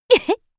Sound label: laugh; Eternal Return; Hisui; KOR